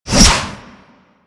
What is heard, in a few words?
Whip Whoosh; Warframe; Weapons; Corpus